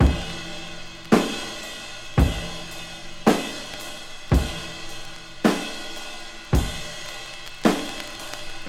110 bpm modern drum loop f sharp key

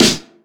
2000s steel snare drum d sharp key; snare drum samples

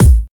raw kick single shot C key

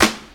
clap one shot d key; percussion; single hits one shots

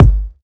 modern natural kick one shot g key

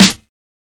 sizzly snare drum sound f sharp key

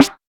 boomy steel snare drum sound C sharp key

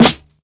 airy sizzle acoustic snare a sharp key; snare drum samples

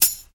clean closed high hat sample f key; hat samples

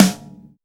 wet acoustic snare sound F sharp key; Bitdepth 24

